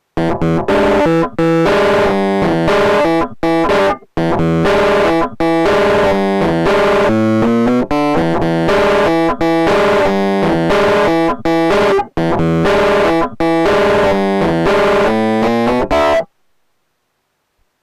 MOD Devices MOD DUO X Epiano MOD BigM Hardware Dist